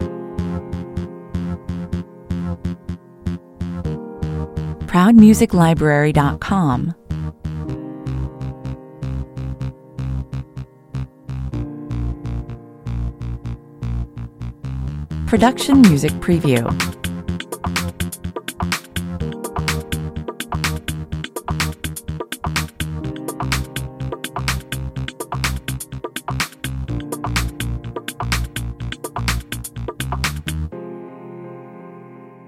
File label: on hold 125bpm